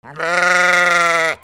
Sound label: ANIMALS